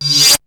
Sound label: FLIP; SoundFactory; SMPMANIA; EFFECTS